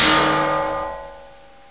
clanger; ambience

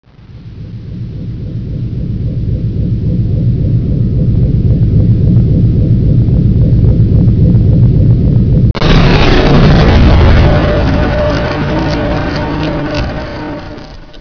crystal death

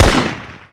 sentry fire; buildable

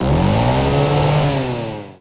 backpack

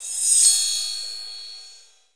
glowcave crystal

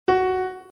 f#2, piano

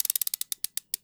sg partial downgrade, sentry; buildable

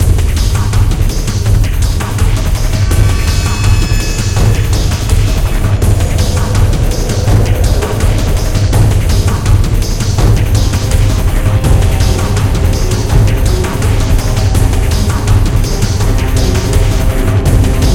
combat loop

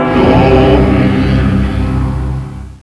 monkey chant; ambience

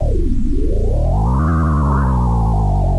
hum; ambience

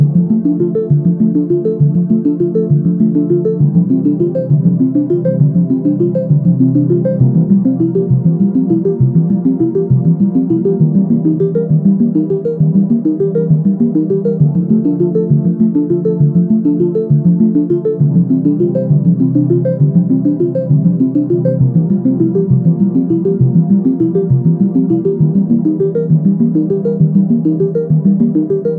music

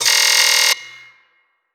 warn

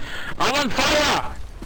fire; Snatch

